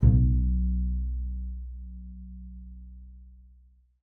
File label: B1, bass pizz; Xsample VOL-5